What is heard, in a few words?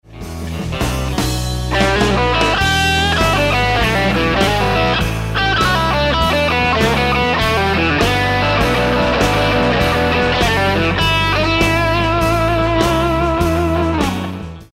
Lick, guitar